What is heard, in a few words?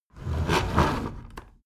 window roller shutter close soft single pull